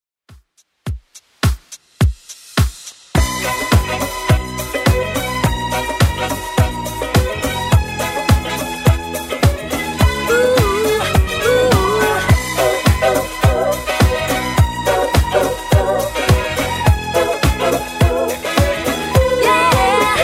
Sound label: 70s 105bpm